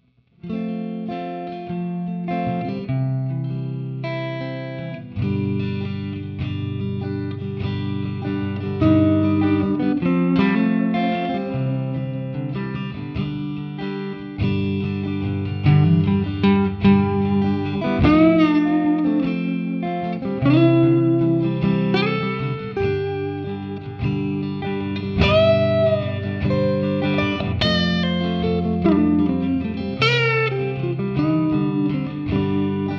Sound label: bass